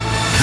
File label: REV Back Hit, Roland JV-2080